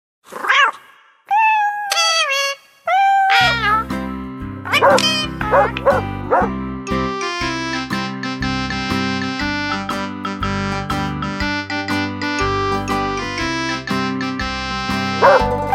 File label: karaoke